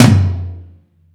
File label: BIG TOM, MOD. DRUMS; East West; Global Sounds